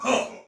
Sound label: Pain, Soldier Male; Dialog; Barks; Battle; Sinecure